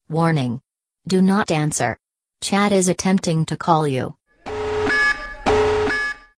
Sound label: Pop